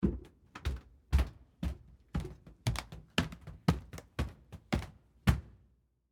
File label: Carpet Stairs Up, Footsteps, Carpet, Stairs, Up, Underneath, Perspective